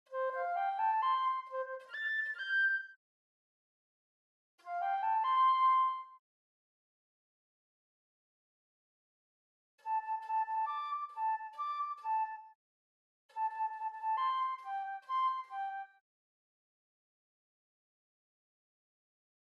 Flute; Gnome forest

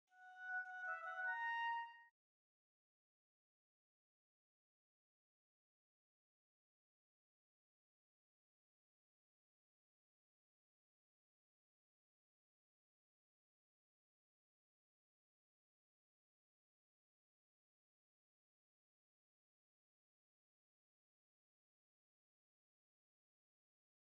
Oboe

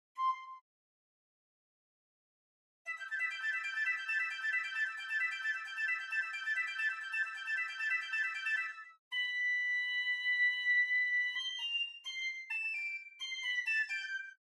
Piccolo

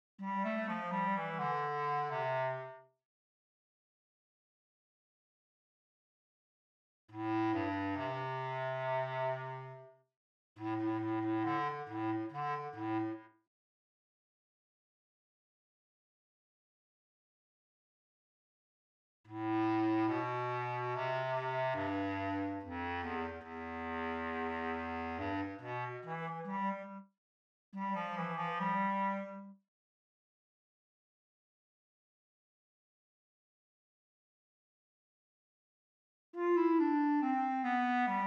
Bass Clarinet; Gnome forest

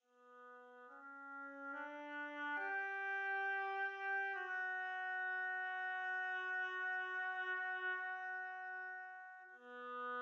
Oboe

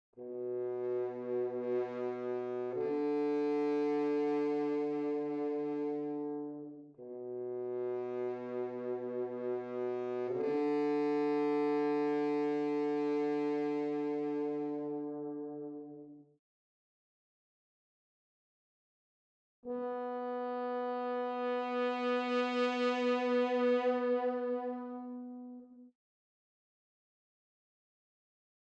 Horn

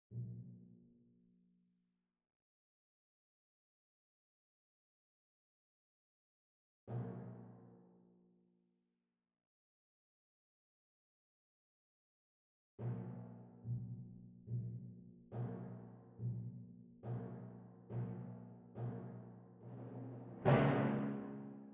Timpani

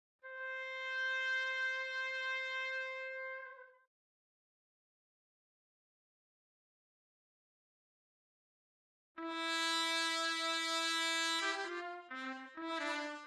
Trumpet; Holst Jupiter

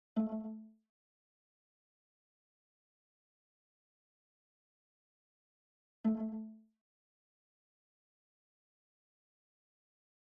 Rondo Viola

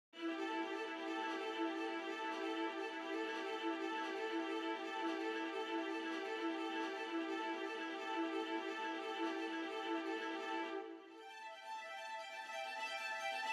Violins

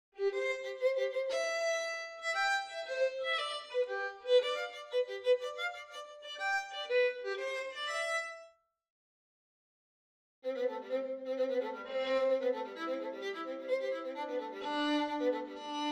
Violin; Overture or Something

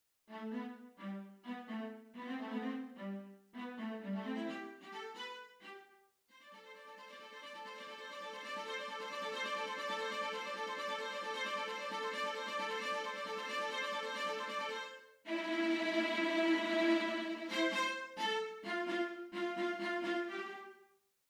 Violas

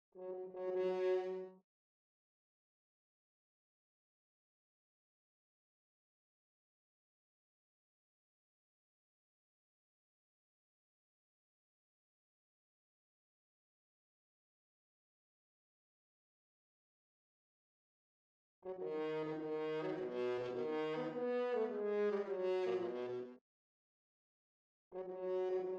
Horn

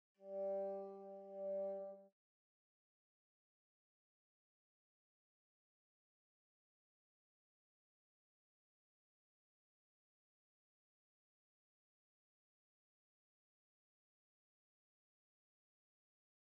Bassoon

